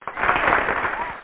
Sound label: Applause (little); Alley 19 v1.11